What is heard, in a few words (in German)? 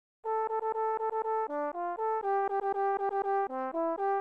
Rhythmus Ubungen; Notenlesen lernen